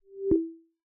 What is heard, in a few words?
Spatial Profile Scan Lock; Apple iOS 18.1; HeadphoneConfigs